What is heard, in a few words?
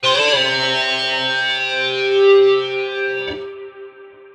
electric guitar note reso bend C